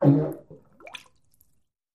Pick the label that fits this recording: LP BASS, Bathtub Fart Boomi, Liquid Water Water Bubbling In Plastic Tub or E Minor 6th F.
Bathtub Fart Boomi